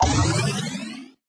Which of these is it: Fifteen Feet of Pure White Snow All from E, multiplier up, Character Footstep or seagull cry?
multiplier up